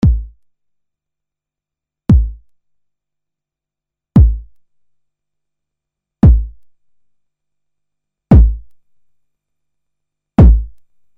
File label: 909 ghost